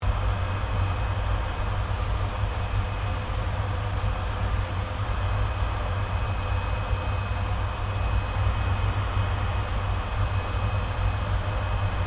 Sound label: AIRCRAFT CA-27 SABRE TAXI INTERIOR; CA-27/F-86 Sabre; Airplanes; Vehicles